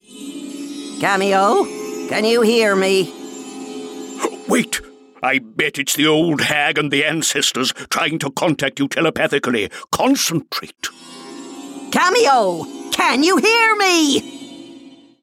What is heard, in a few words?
Vox; Kameo